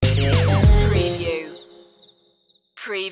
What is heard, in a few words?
sting